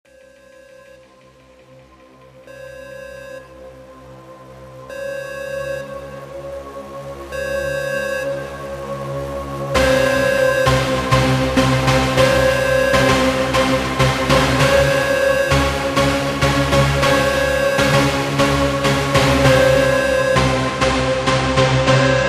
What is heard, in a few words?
Slowed Reverbed bassboost